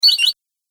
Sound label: Birdy Sound Game Fx, video game; Twistedtracks